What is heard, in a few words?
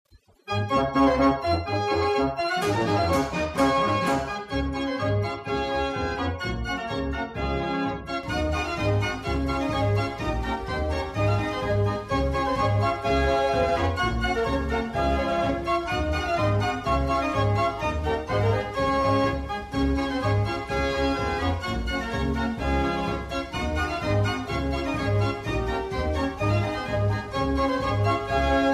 BELGIAN, band organ